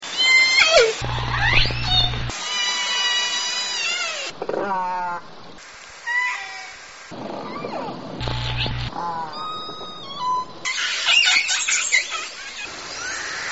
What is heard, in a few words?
call diversity